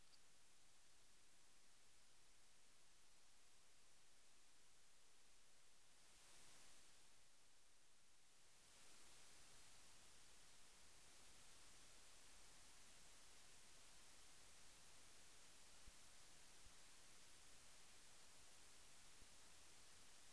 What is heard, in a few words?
Waves